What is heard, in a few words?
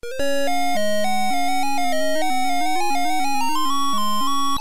Game Start